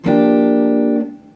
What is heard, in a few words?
mellow jazz guitar, Mellow Jazz Guitar Chords